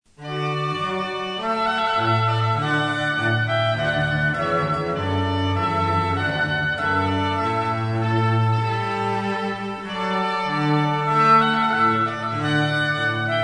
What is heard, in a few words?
Trumpet Voluntary, Cello Trio